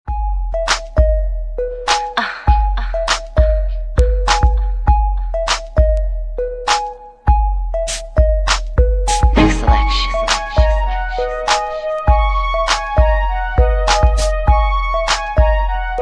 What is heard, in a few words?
women slow ringtone